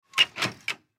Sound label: Baby Crib Lift Gate; Household